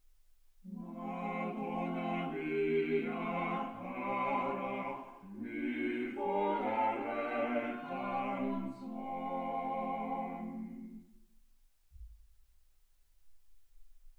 quartet

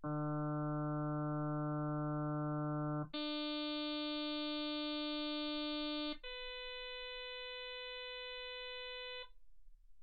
harm sinus 48kHz

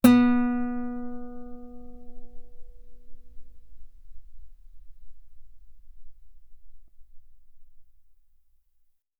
Guitar B3; ff; sul B

